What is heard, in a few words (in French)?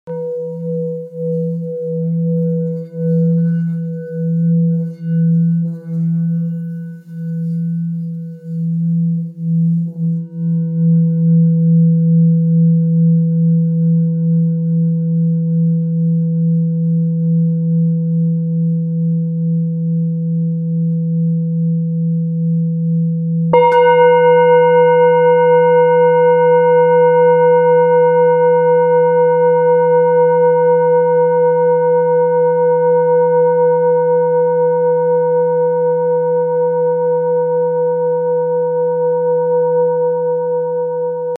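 Bengale Si 1-1.1kg, Bol Chantant Tibétain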